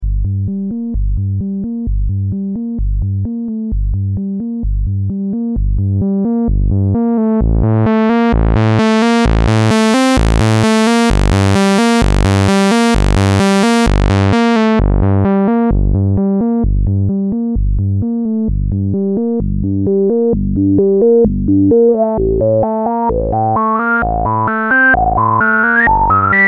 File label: SH01a Saw Wave Filter Modulation